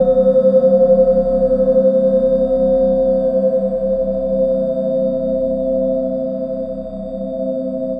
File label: Holographic, Synth SFX; Sonic Foundry (Sony Creative Software); The Electronic Music Manuscript Collection